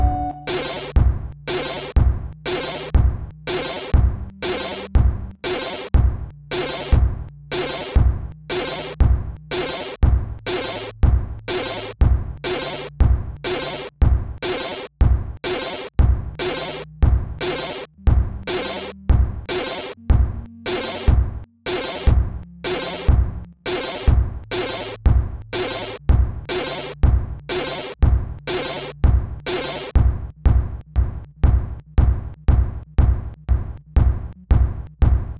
Sinewav project

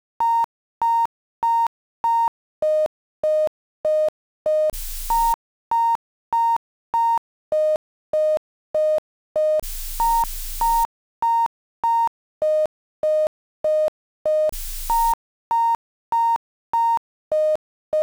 Sinewave

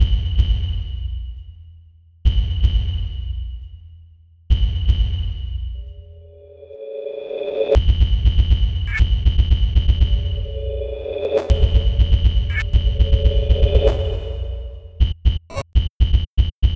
Sinewave song

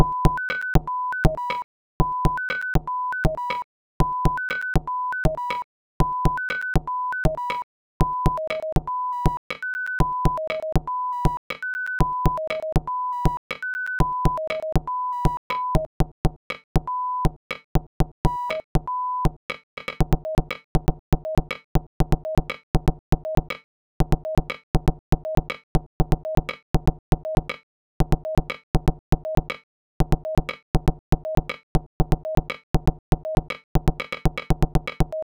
Sinewave

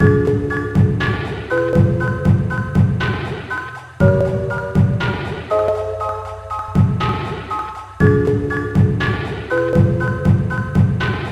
Sinewave Assignment

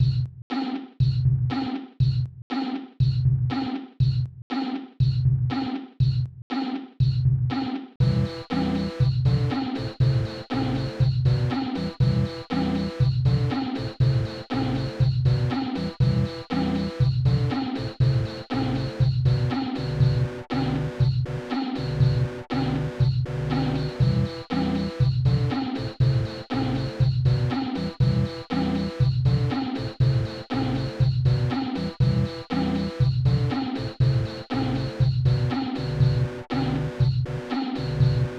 Sine Wave

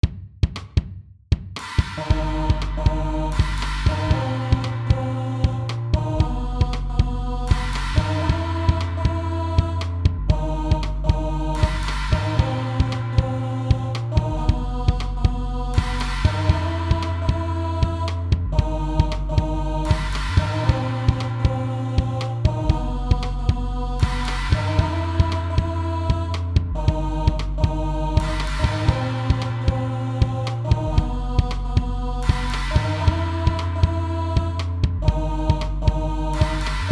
MIDI Assignment

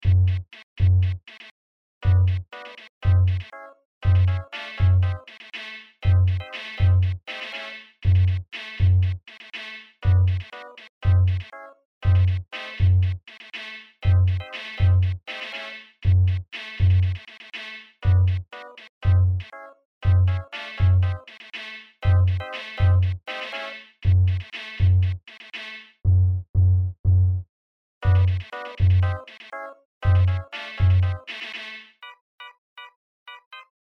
Sine Wav

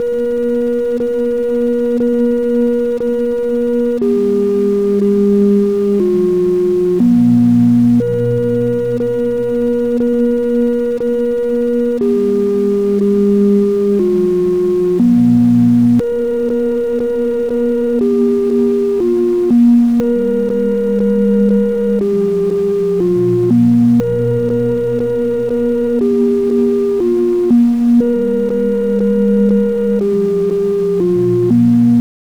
Sine Wave

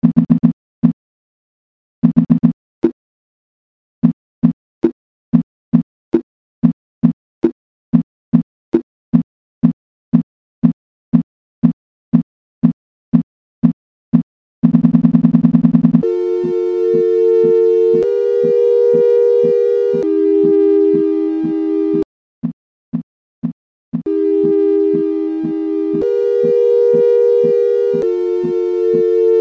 Sine Wave

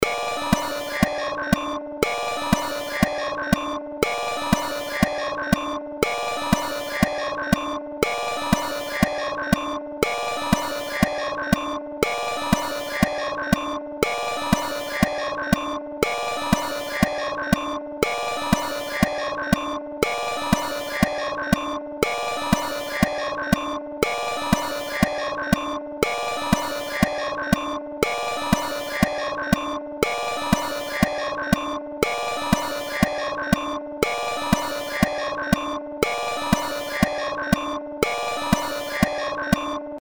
Sinewave experiment

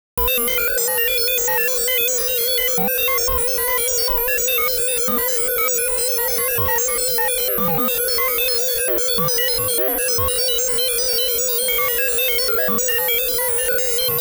Sinewave

